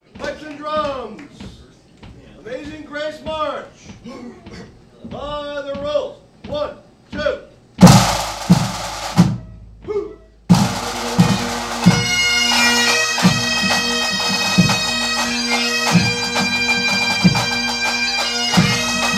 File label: Pipe Amazing Grace